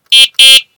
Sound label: electric car horn